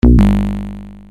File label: null Hit; Mid-Simulator